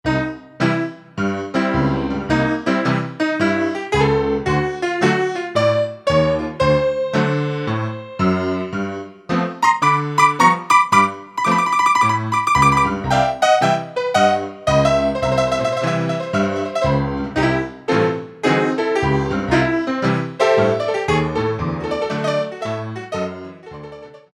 A. Piano; Roland U-220; Factory Timbres

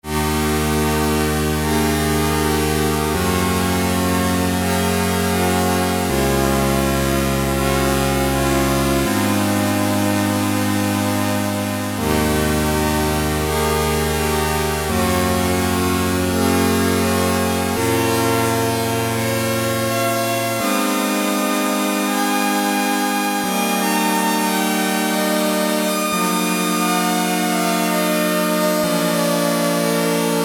Synth Strings; Tones

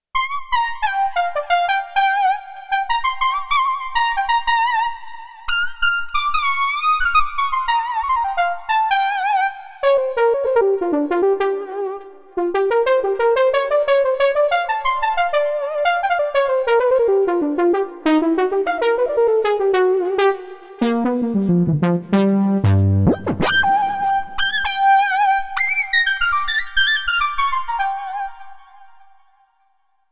Roland JD-800 Fusion Solo; Factory Patches

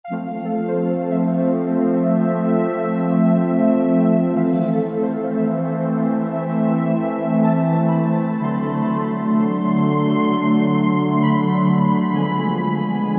Marine, Roland SR-JV80-07 Super Sound Set

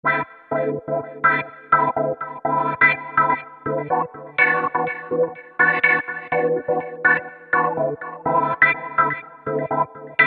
ORGANS Smooth Dance Organ; Apple GarageBand 2

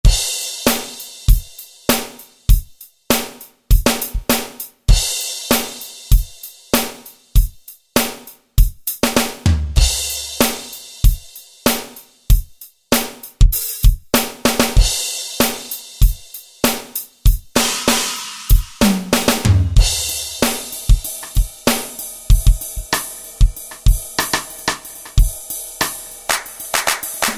Standard Kit, Korg TR-Rack

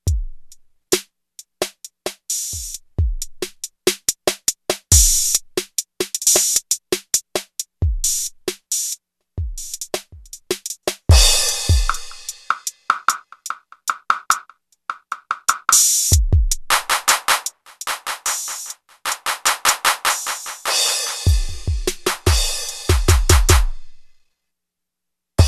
COMPRESSOR, Yamaha REX50

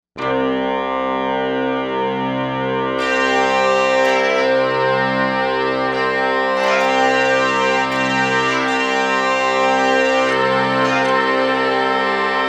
Bag Pipe, Korg M1Rex; Factory Patches

